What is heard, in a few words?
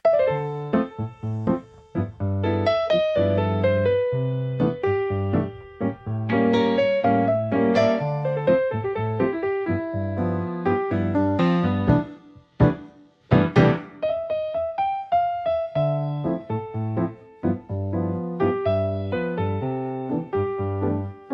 Piano bossa nova